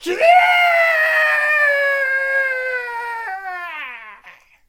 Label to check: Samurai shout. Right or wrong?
Right